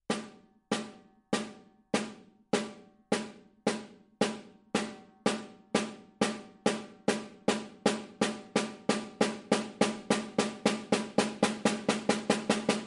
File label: drum